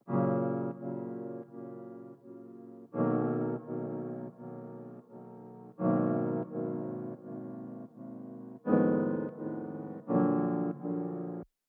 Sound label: 84 BPM D# Min Piano; Loop Stems; Cymatics; 2022 Melody Collection Platinum Expansion - Pt 2; Tonight